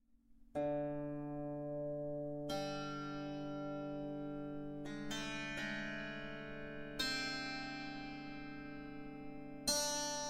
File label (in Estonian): roobi lähedal, Kannel; Kui tõmmata aga roobi lähedalt, tekib tervam, nasaalse iseloomuga ja kiiremini kustuv heli, mis meenutab teatud määral klavessiini.; Resonabilis